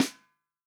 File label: FUNK SNARE CHROMATIC, REAL DRUMS